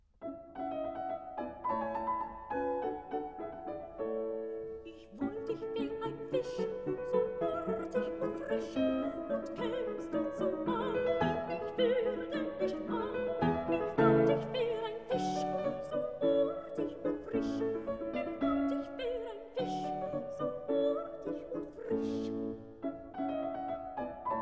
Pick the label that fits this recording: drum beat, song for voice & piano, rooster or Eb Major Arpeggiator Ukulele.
song for voice & piano